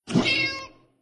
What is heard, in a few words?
cat